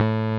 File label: Roxichord, E.Piano